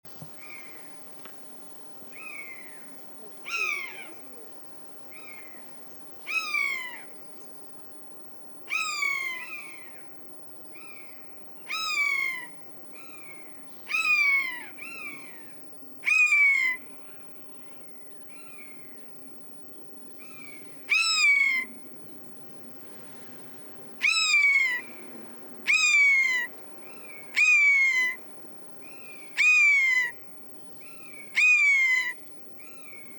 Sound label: Garrulus glandarius; SETE